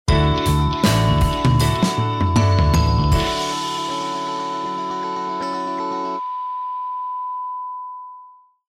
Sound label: Jingle